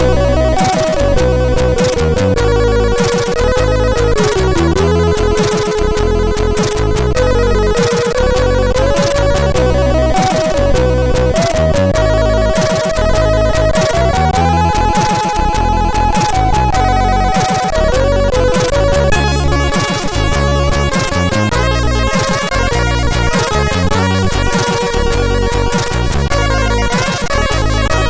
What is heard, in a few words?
Triad Intro sid MOS6581R2